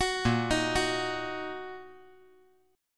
A sharp Suspended 4th Sharp 5th down

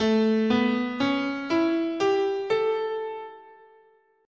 A Dominant Pentatonic

